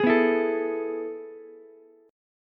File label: A Major 7th Suspended 2nd, Ukulele; AM7sus2 strummed; JGuitar